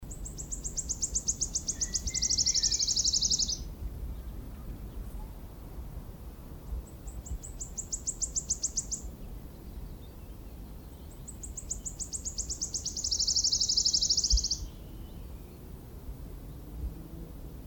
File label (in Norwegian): Bøksanger; Arter